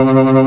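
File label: rock organ; gmplay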